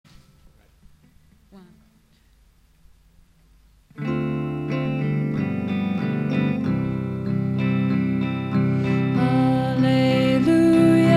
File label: Gospel Alleluia